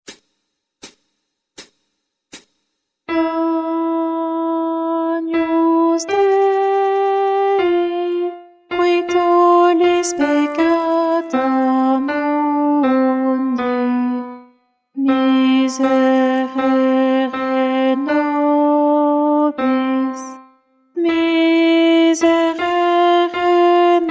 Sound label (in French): Messe de St Paul Agnus Guide chant Alti